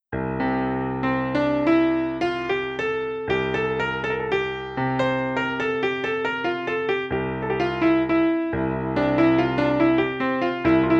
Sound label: Short Improvisation in C Mixolydian